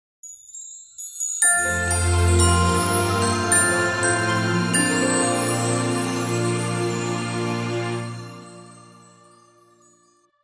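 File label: Christmas Logo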